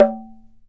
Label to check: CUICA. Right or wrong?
Right